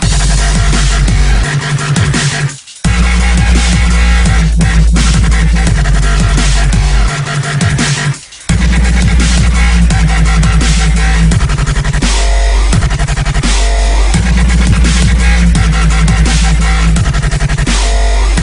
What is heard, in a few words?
drop; TF2Maps; chillskies; wubwubwub